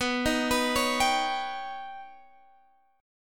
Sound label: D sharp Augmented 7th B, guitar